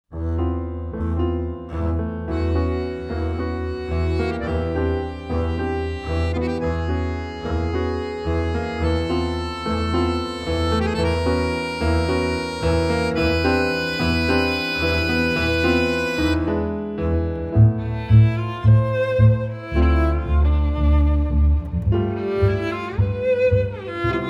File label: tango rosa extract